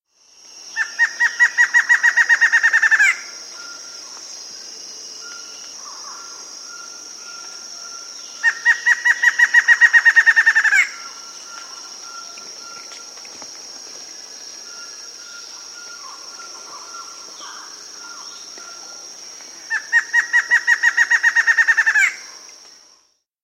Chestnut-Backed Antshrike, Nature's Songs, S. America